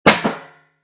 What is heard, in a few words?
Metal Buckle, Metal buckle with return; Clangs; Objects